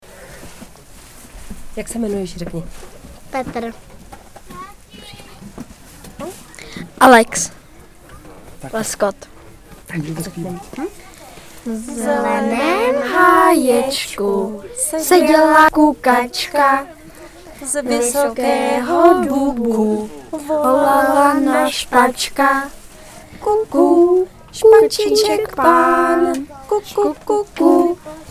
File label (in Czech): slavíci; ornitologický víkend